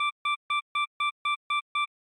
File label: selftimer 2sec, camera; Sony Xperia XA2